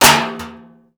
Metal Strike

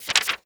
Book Page Turn

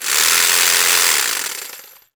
Jig Saw; Tools

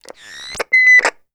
Walkie Talkie Interference; Communications

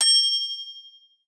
Bell, Metal

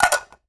Plastic Plate, Kitchen

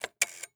Telephone Rotary Dial; Communications

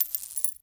Coins Falling on Floor

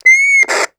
Walkie Talkie Feedback; Communications

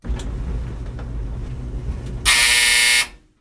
Dryer with Buzzer; Appliances

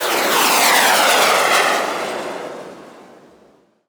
missile fly by; Weapons; 1001 Sound Effects

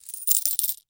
Coins Poured Out

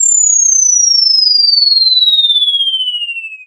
Bomb Fall, Comical Effects

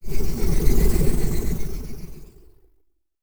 Space Age Motor Cycle, Sci Fi

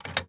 Dresser Drawer Handle; Doors & Drawers

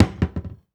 Cabinet Door Close; Doors & Drawers

